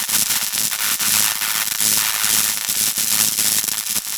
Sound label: Beam Impact Default Loop; Warframe; Weapons; Bullet Impacts